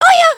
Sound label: Wave Fast; emotes